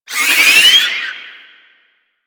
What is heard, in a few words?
Hand Bomber Proj Warning; Warframe; Man In The Wall